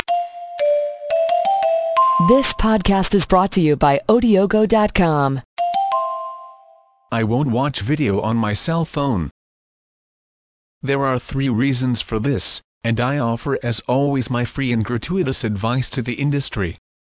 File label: cell battery